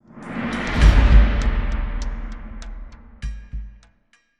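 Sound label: Sting Bass Perc; OMEGA LIVES; Transformers War for Cybertron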